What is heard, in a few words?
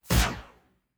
Sci Fi Blaster